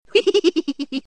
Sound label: hehe